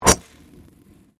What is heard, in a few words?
Convolution Surge